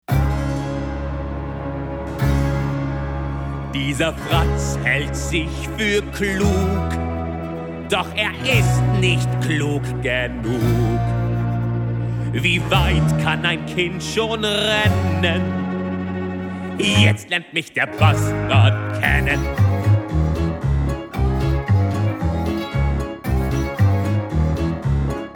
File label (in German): Gauklersong; Der kleine Störtebeker; Schmidt Theater